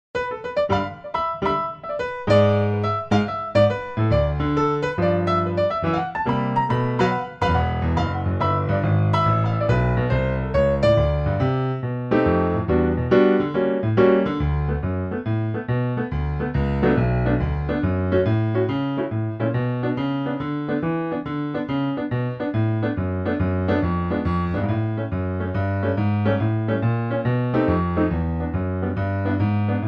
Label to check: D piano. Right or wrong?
Right